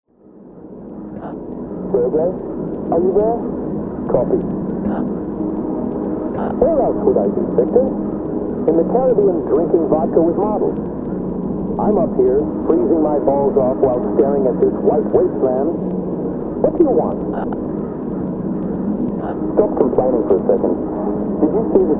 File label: airport